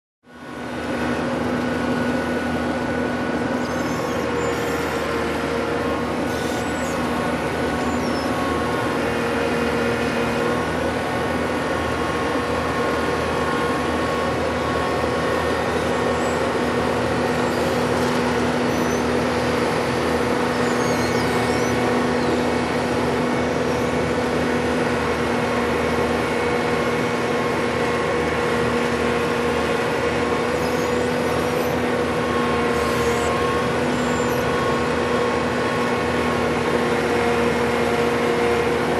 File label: Concrete mixer unloading